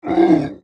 die, elite, involuntary, death instant; covy involuntary

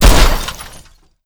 jeep crash hard

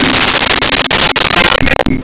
Celeste C4; Nintendo; July 2020 Gigaleak

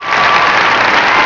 CHEER; kansei

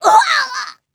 death flying, grunt involuntary; covy involuntary

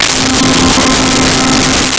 Sweep Pad C5; Nintendo; July 2020 Gigaleak

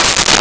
Timbale; Nintendo; July 2020 Gigaleak

